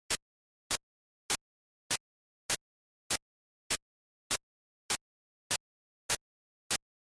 example of the perceptually regular binaural sequences; Sophie K. Scott, Jason Mattingley, Tom Manly and Richard J.S. Wise